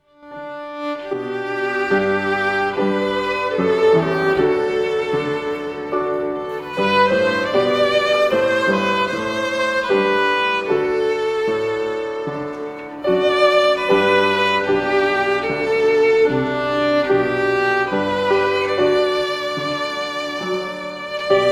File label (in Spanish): violín y piano